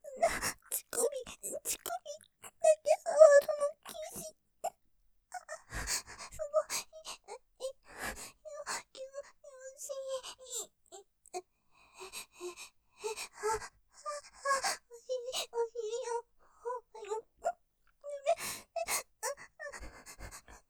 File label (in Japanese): 喘ぎ 乳首さわるの禁止！感じちゃう, ASMR; ステレオ; ループで楽しむ！シェフの気まぐれ抜き抜きコース